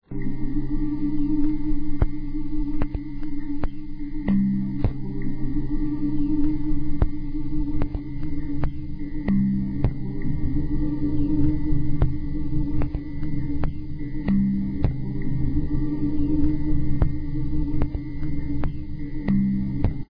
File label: minuet low